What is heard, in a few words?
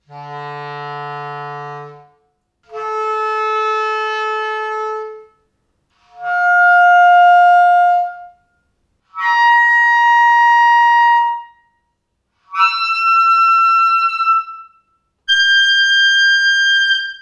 clarinet harmonic